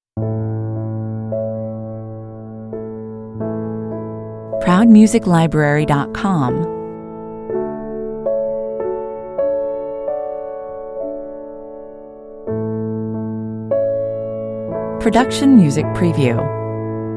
piano only

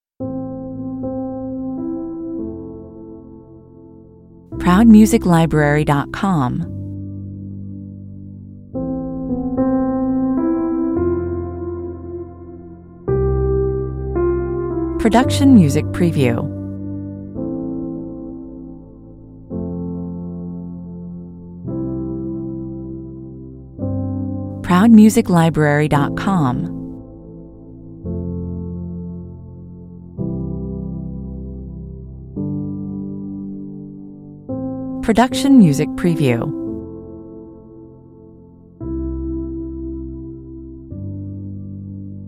piano only version

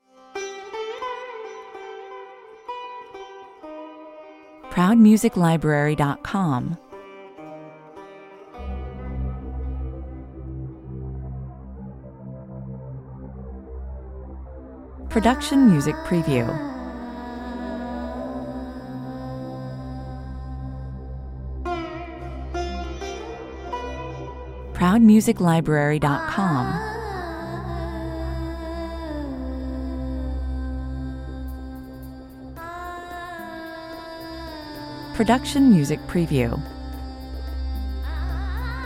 sirens call

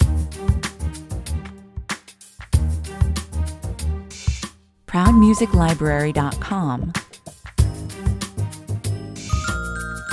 family friendly vibes 95 bpm

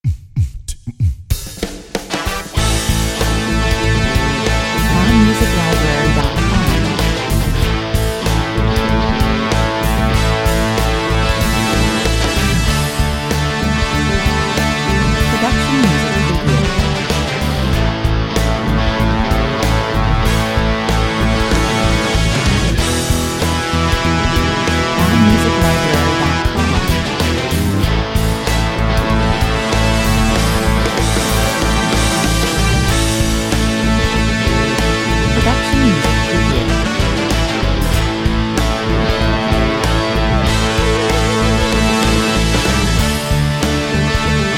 brass 95 bpm

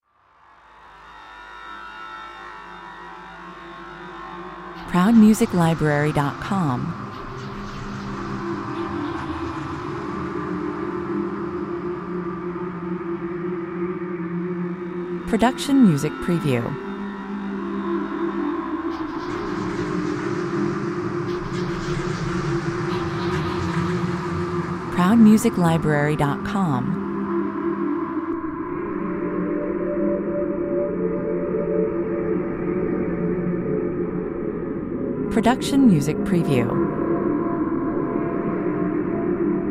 grunge dawn 116 bpm

